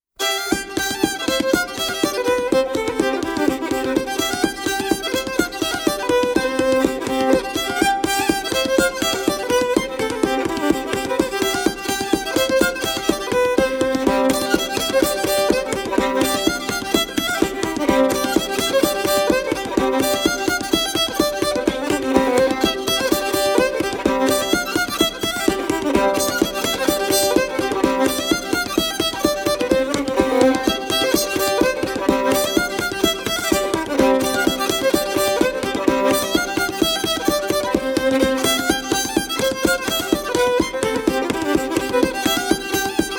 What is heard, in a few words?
D Tune, Fiddle; Canadian Fiddle; Canadian Fiddle Hall of Honour, inducted 2022